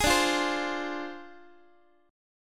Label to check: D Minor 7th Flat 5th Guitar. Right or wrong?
Right